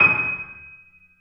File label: piano f d#7, Grand Piano; Sonatina Symphonic Orchestra